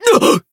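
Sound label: damaged, Japanese; joker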